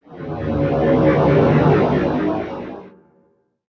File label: grav ship; PC Gamer IT CD 47; Centauri; alien-x-fire-demo